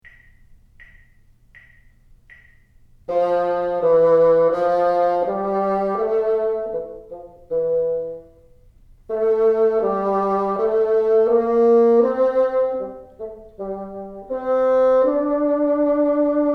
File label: teacher, bassoon